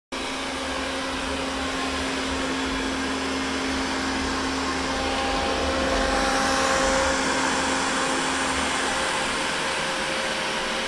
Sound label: Robotic sweeper